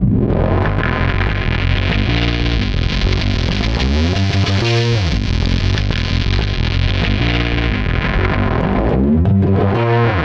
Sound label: Distort Wah, Bass; Effected; Bass X